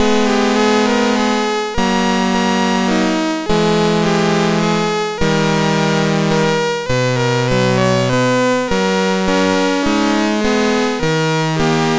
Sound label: Digi Hits, MOS6581R3